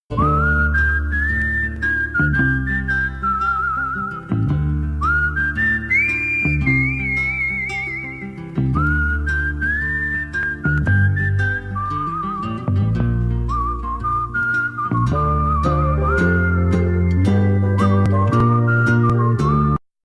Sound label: awesome western ringtone; ton de apel